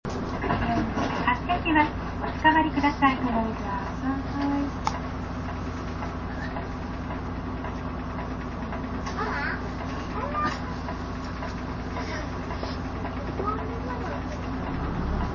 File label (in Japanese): バス走行音・放送, 日野ブルーリボン; 都営バス; 品川駅前〜泉岳寺前; 都営バス 品９７系統; スピーカー真下で収録したため車内放送も; 品川駅から泉岳寺前までを収録しました。